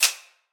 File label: Bar Graph Inc Type